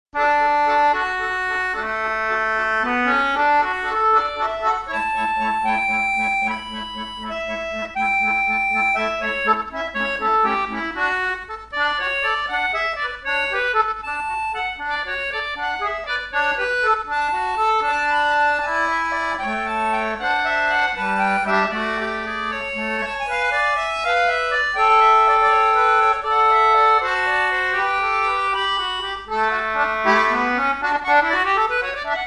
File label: duet